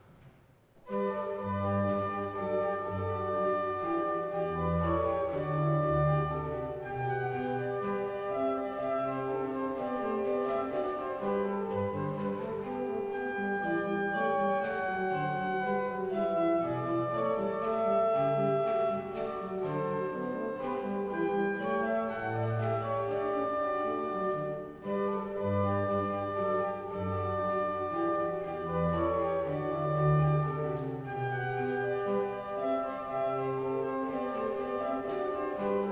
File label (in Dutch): Goldberg variatie, orgel; Vries; variatie19; Drenthe